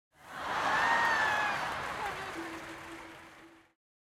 crowd laughter interior traveling circus tent small stereo; sonniss gdc